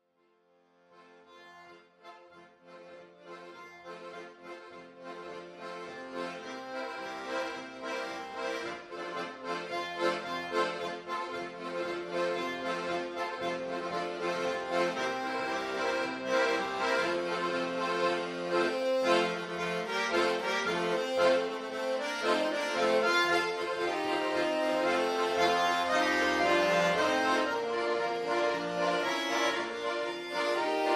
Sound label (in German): Konzertausschnitt schweizer Folk